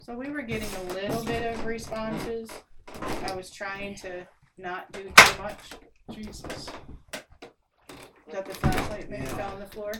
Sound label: EVP; Up Couple Room; wexford general store antiques investigation